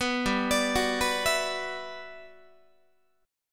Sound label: G Major 13th, Guitar; Listen to GM13 arpeggiated; GM13 Chord